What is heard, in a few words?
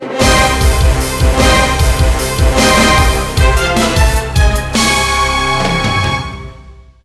Stage clear; SD Gundam G Generation Spirits; ps2